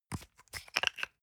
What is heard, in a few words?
toothpaste screw cup open hard